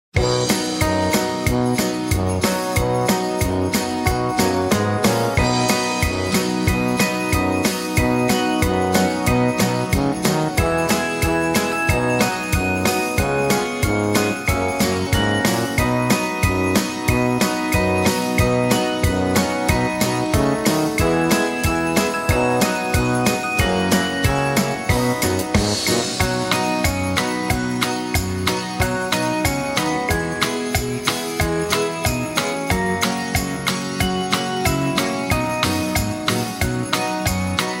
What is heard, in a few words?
Jingle Bells; Holidays; CHRISTMAS